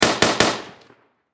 Machine Gun 7.92 Caliber Mp44 Stg44 German Triple Shot Distant Perspective; Warfare; Machine Guns